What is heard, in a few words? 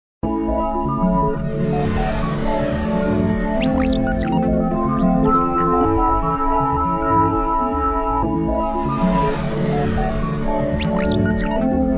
Ring Zen; Asus P526